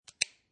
Pen Place Cap On Pen, Place plastic cap on plastic pen.; Pens; Offices